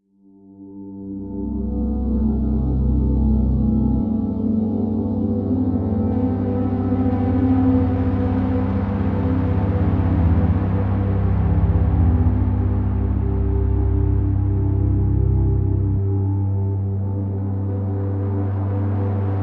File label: melancholy texture; sonniss gdc